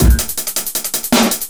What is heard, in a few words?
DRUMLOOP D+B 160, Drum+Bass Loop; eJay